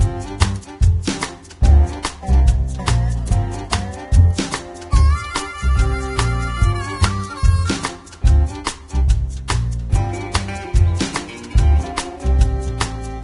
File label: Train Music; Diner Dash: Flo on the Go; NDS